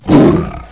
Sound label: Hound Attack